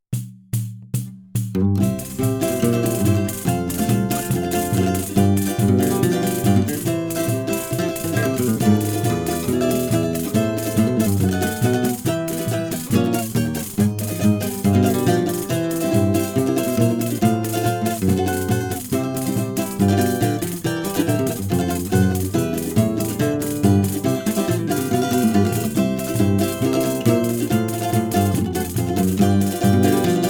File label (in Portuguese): playback sem melodia